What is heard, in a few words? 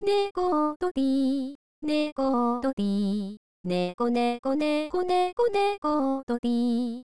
music